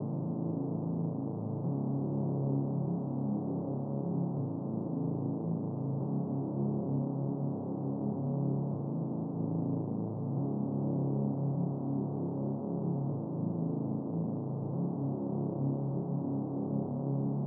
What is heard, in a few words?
110BPM Low Pad